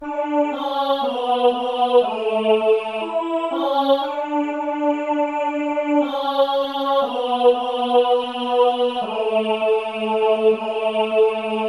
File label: Alt solo; Com przyrzekl Bogu